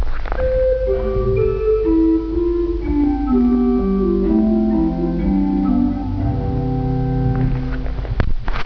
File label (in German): Gedeckt 8; Vitus